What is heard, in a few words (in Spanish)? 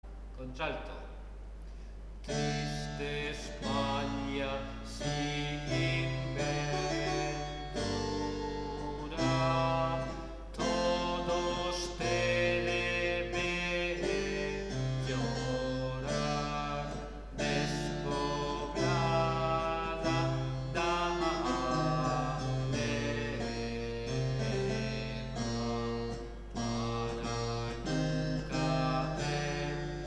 triste espanha alto